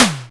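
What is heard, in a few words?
snare drum, Simmons SDS8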